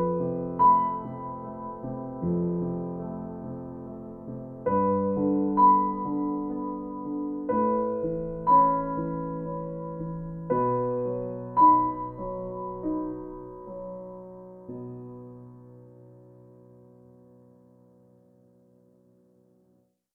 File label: garritan noise